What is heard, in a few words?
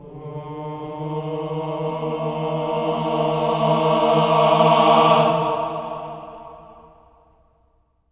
CLUSTER, Voices